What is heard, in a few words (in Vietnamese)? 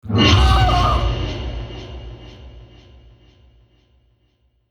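tiếng hét ngắn vang vọng giọng đàn ông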